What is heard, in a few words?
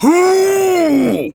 Laugh; Kuva Lich; Persona D; Ability Lift